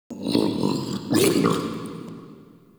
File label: Interior Munching; Ambience; Indoor; Warframe; Infested Eris